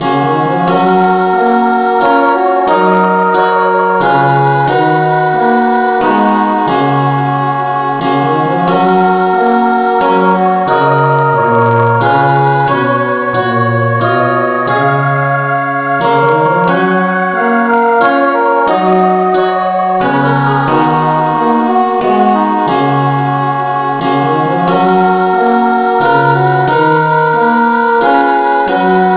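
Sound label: no vocal key f sharp, Amazing Grace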